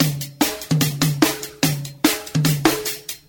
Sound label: bongoid, funky drum loops